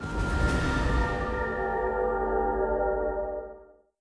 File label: Reel Spin; Mirror Magic; genesis